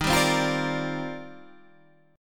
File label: C Minor 11th D sharp